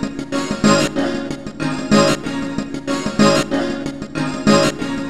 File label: Beat, 94 bpm